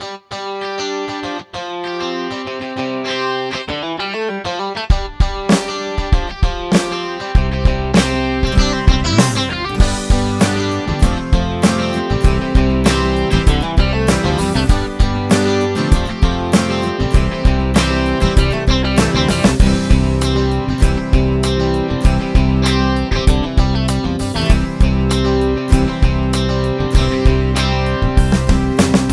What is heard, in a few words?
Alabama Rock